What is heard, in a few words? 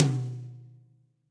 Tom Low Bright Room; Drum Hits; Standard Hits; Ableton; Factory Packs; Session Drums Studio